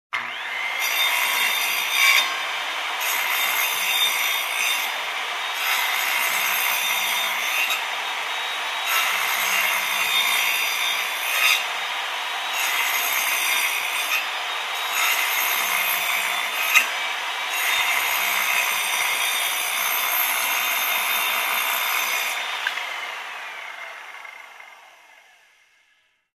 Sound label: Construction